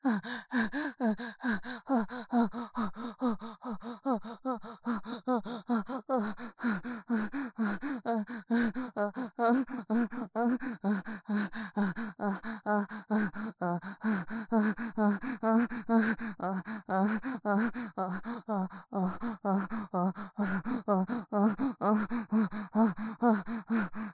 喘ぎ声 大

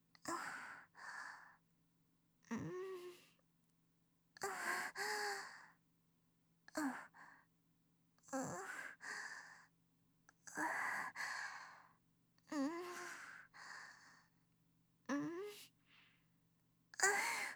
喘ぎ声(極小)

